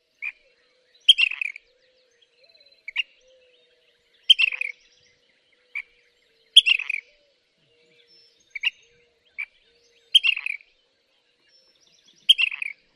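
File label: dawn song, bird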